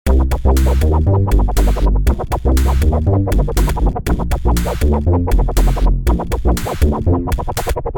danger funny; Identity V

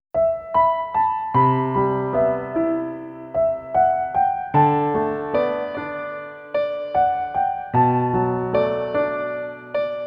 Piano Version

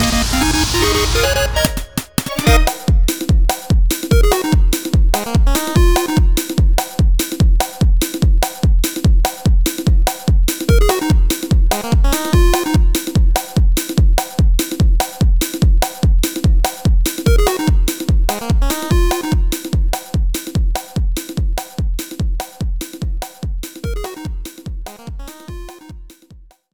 Checkpoint

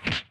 Punch

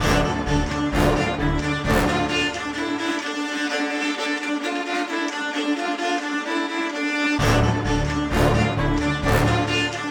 Combat; The Witcher 3 Wild Hunt; Skellige; Ice Giand Island Combat Layer 02 v2

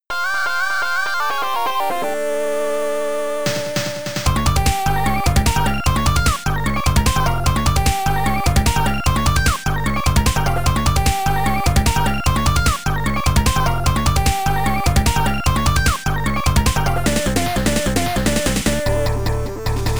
Medieval England Boss Battle

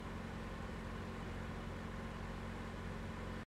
Transport Loop; virtual city windows gamerip 2009

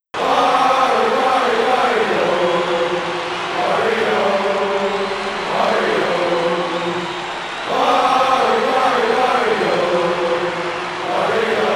Crowd Chant; mario strikers charged football wii gamerip 2007; Wario

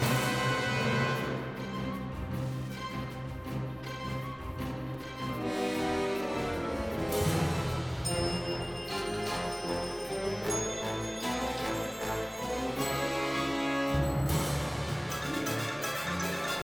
Fight Music

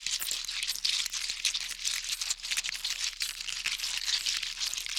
Spider skitter; Idle Champions of the Forgotten Realms

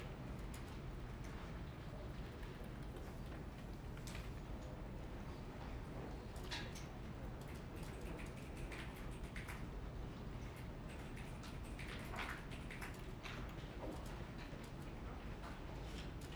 crowd small loop

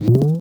explosion bit

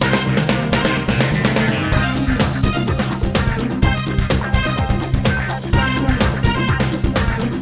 Win Loop; Pictionary; NDS

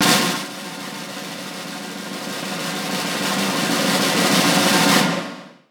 Winner Drumroll; Party Match; ARMS

